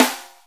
Snare, Akai MPC500

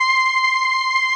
505, String Machines

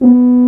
Tuba p B2; Digital Sound Factory Virtuoso

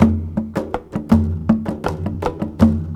breaks 162

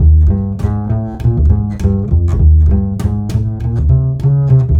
Acoustic Bass; Sonic Foundry (Sony Creative Software); Leo Cavallo Bass Taster

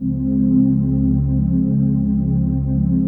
TRANCPAD; Best Service; XX Large Pads; Sample CDs

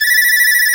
BON DEE G3#

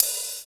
OP HAT; East West; Phat & Phunky

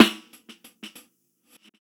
Snr; Roland MV8800

